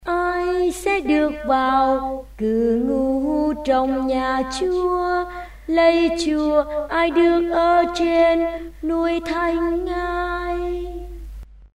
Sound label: tenor basso